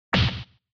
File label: SNES Fighting Hit